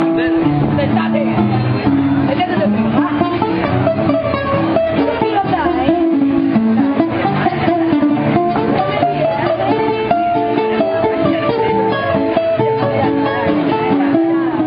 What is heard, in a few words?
tango, argentina